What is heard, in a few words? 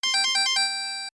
Correct Answer